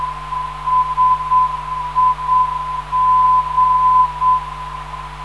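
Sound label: 410khz, non-directional beacon; SIG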